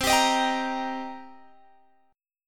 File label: C Minor 7th, Guitar, Open G